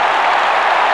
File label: CROWD; Andretti